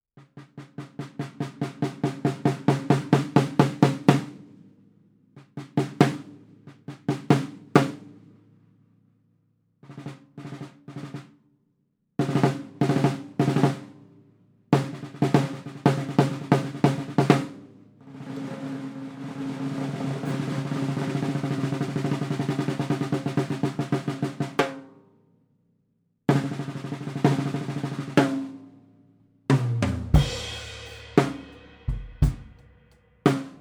8x15 Leedy Ludwig

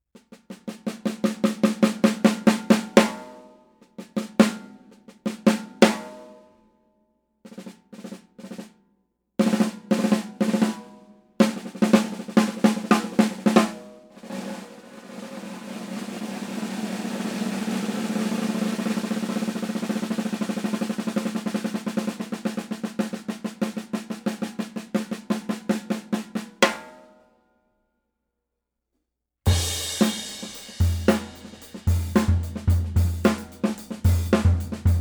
Walnut, Craviotto 65x14

